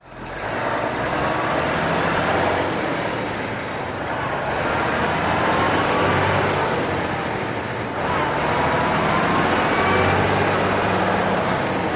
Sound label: Truck Accelerate